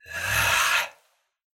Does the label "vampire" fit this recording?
Yes